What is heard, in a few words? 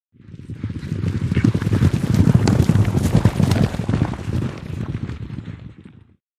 By Group Horses Gallo